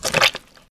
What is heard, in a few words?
gut SQWLTCH